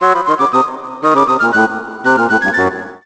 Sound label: SFX Fail; Futari wa Precure Splash Star Panpaka Game de Zekkouchou